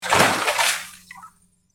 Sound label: water splash